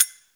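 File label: finger cymbal; AKAI S6000 CD-ROM - Ultimate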